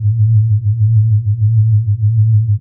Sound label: loop gate